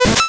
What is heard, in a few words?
button